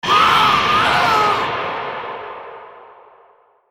tiếng gào thét tra tấn vang vọng kinh dị

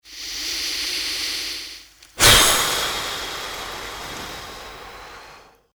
Tieng nguoi dan ong tho dai

